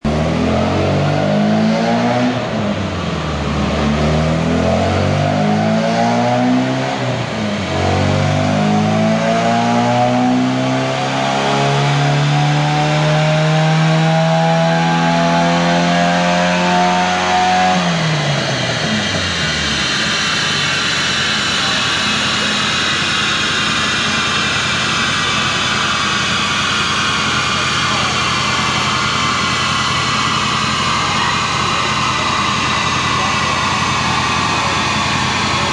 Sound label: dyno